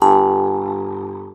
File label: MT G, JHARP G B; Xsample VOL-9